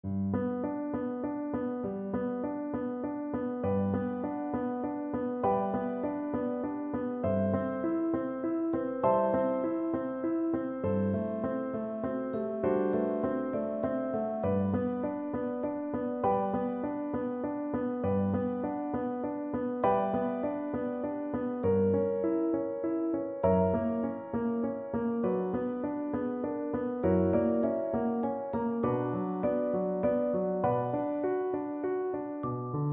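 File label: vibraphone, Percussion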